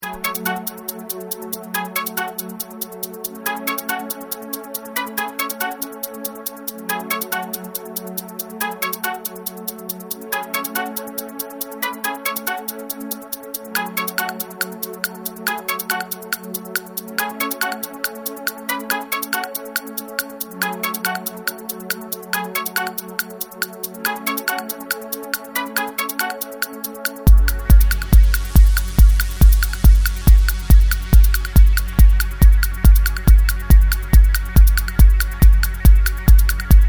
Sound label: Remix